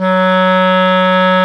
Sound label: CLAR, Clarinets, Orchestral Winds; Roland LCDP04; 90 s Sample CDs; Clar Cls